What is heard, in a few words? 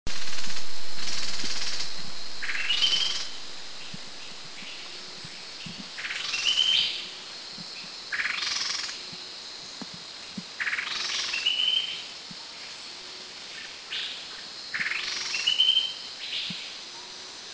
Fluffy-backed Tit Babbler Chatter; Thailand; Krung Ching